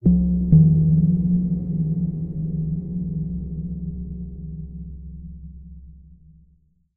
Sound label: editor blips; Source Engine; Portal 2